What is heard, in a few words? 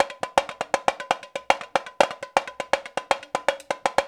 RIO, Rio Batucada